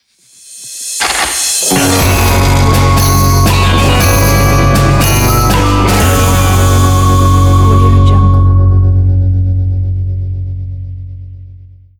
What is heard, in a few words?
spaghetti west logo; andyslatter